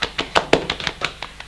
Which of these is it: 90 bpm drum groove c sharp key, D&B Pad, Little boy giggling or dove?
dove